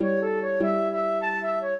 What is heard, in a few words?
trio, flute harp; Mozart